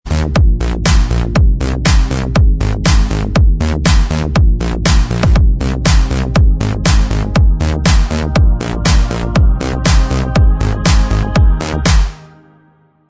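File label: instrumental, track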